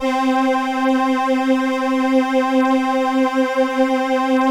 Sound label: LAMBDA, String Machines